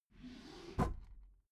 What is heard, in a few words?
desk drawer open regular